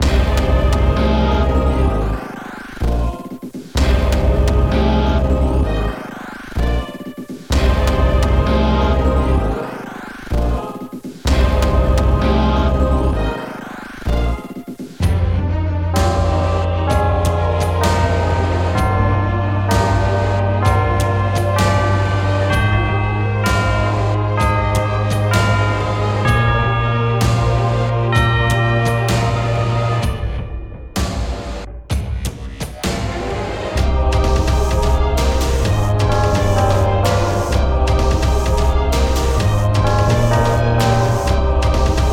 Powerful cinematic songs that seem to fit the battle scene